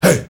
HEY HEY

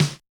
Yamaha DJX 2B, Snare L

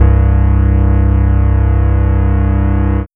RAGE BASS, Bass Analog & Synth, techno; USB Soundscan vol.12

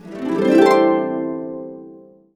C, Harps; USB Soundscan vol.62; AKAI

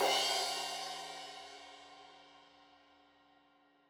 Crash 15in L edge, Cymbals Stereo

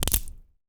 WOOD STICK, SMASHING; AKAI S-Series CD-ROM Sound Library VOL-6

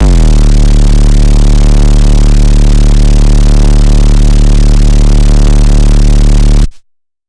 D 808 Perfect; bass

